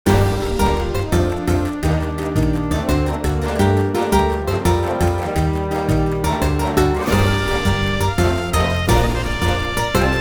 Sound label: Menu; puss in boots 2011; nds gamerip